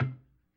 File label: B3 PERC, Vintage Keyboards